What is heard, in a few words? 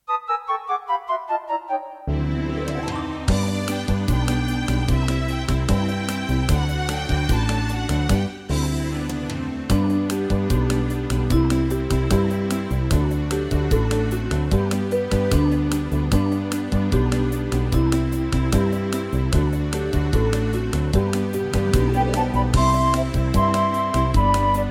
karaoke only